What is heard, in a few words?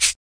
hat; Hats